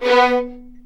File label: B3 VLNS ARCO DB; East West; The Ultimate String Collection